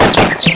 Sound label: Bird